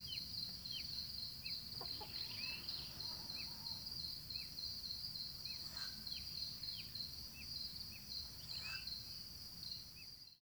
Humes Owl Deep Tuc Tuc Of Male When Leaving The Nest